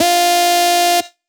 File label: Lead - F